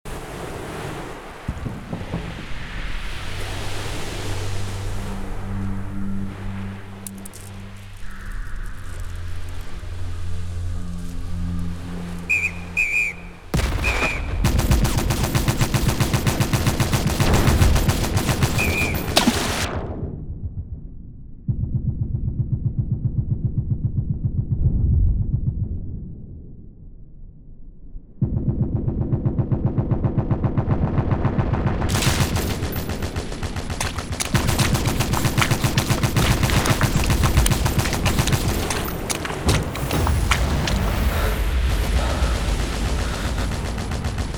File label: Soundscape; Saving Private Ryan